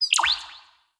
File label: UI Pad Close; blue archive